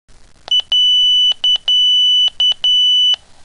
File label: minitor tone